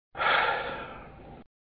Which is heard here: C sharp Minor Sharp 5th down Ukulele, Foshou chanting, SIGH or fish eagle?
SIGH